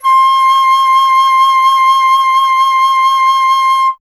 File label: FLT, Solo Instruments; Miroslav Vitous - Solo Instruments VOL-2